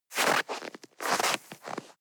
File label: step snow scuff